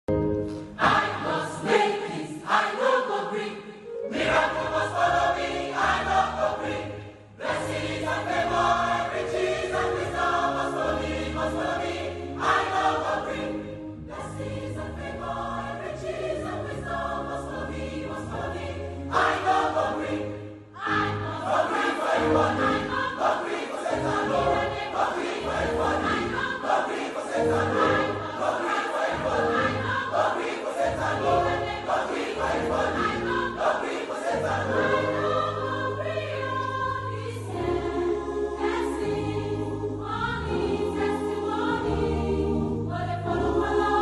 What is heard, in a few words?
Choir